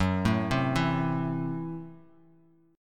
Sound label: Gb Minor 7th Flat 5th, Bass Standard; arpeggiated; JGuitar